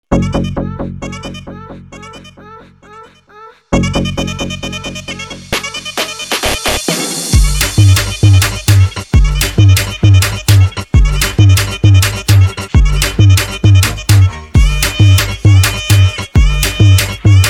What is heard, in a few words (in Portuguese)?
funk agressivo